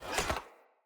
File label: Cleaver Equip End, Swords; Weapons; Player; Bromius Cleaver